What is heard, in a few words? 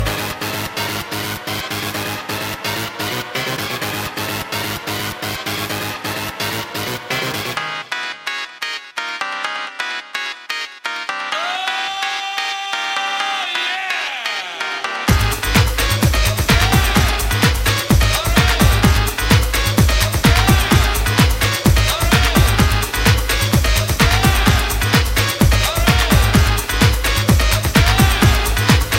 Rave Alarm